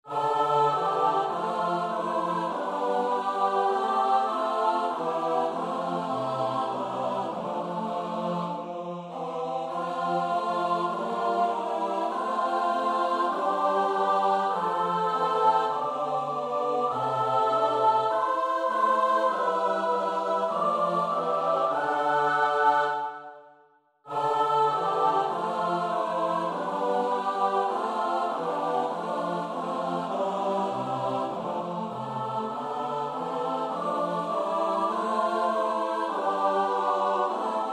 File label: Psalm 125 (S-A-T-B)